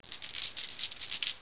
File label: chain rattle; NETSTORM